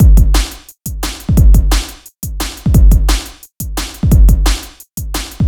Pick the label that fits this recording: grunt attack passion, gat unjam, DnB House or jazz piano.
DnB House